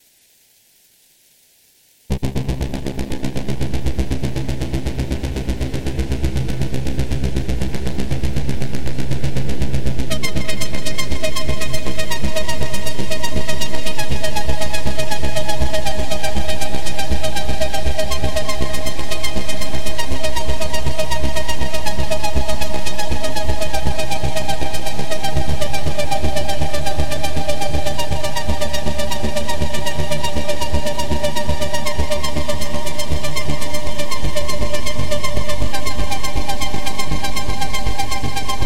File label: arp; gforce